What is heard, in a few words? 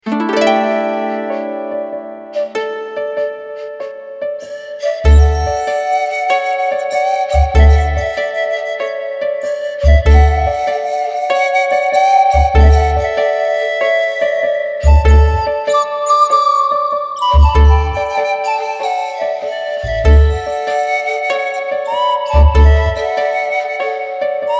Instrumental